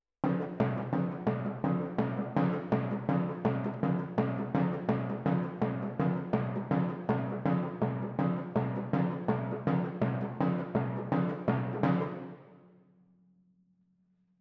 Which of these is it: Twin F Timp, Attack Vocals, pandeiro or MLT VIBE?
Twin F Timp